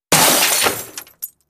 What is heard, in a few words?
Glass Impacts Debris; windows & mirrors